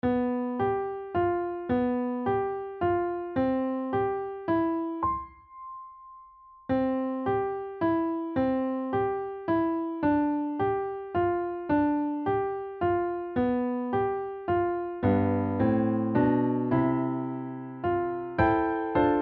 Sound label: goodnight accomp only